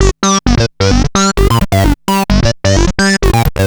Analoop, Industrial Analog

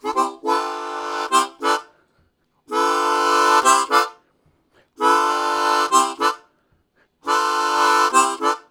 Fat Minor, Harmonica; Zero G

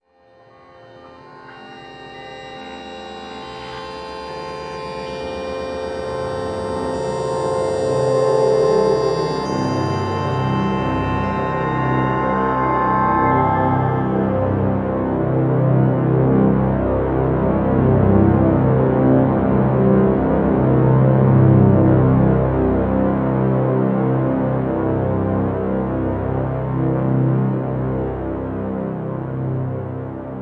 Low Complex, Complex Wave; Sonic Foundry (Sony Creative Software) - James Johnson Spektral Minimalism

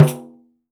14 PANDE, PANDEIRO

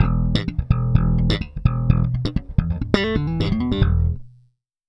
Slap, Bass; Bass X

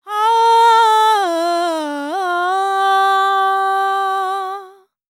World Voices, INDIA; ILIO; Vocal Planet

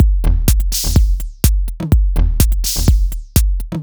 125bpm, House Drumloops

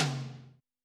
TOM, Yamaha SY85 Drums

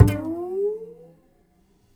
HAR SLIDE, Upright Acoustic Bass; 1ST POSITION; Larry Seyer

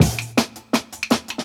10 Pin Beat; Original Jungle Breaks

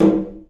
DRM; E-MU Producer Series Vol. 5; 3-D Audio Collection; 3D Multis; 3D Sprint Select 2

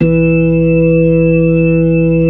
B-3, B-3 Brite; Keyboards of The 60's and 70's

